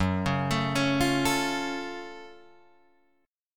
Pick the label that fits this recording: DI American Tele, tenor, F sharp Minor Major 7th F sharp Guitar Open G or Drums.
F sharp Minor Major 7th F sharp Guitar Open G